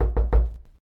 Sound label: Knock Sound; Cacophobia